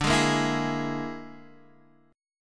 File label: Bb 7th Flat 5th D